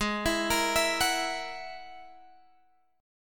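A flat 7th Suspended 2nd Sharp 5th A flat Guitar Drop D, chord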